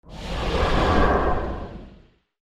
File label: wind; battle